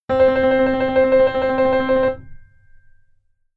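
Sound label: keyboard tremolo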